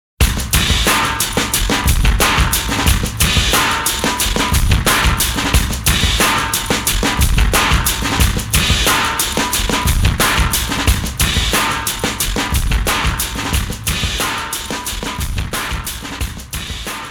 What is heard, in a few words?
RHYTHM BOX; Shin Sangokumusou; kyukyoku ongen